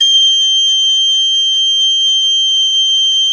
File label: G#7, garklein; SoundBytes - Early Patches